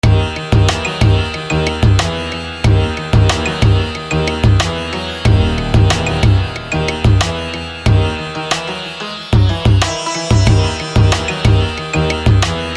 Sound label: Grand Sitar